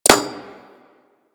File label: Bullet Impact Metal; Warframe; Weapons